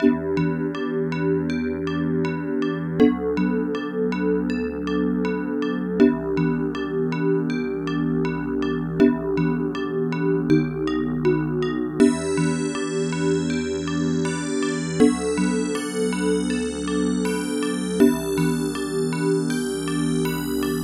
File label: LULLABY